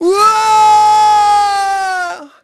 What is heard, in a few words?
fall; Pulse Racer